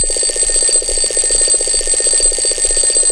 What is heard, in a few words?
Alarm